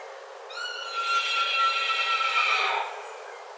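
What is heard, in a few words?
Equus prjewalski adult; Przewalski's wild horse; The stallion neighing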